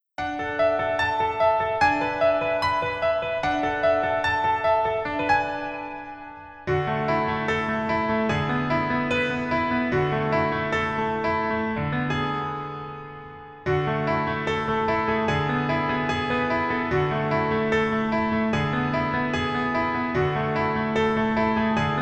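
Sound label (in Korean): Piano version 멜로디MR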